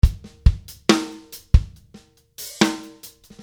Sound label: rnb 70b; Drumloops